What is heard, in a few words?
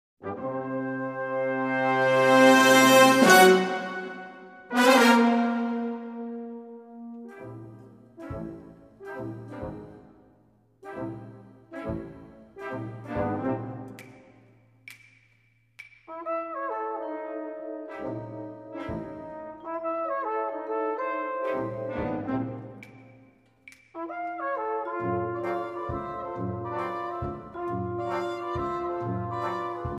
brass